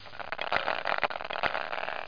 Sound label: random numbers; Widget Workshop; Maxis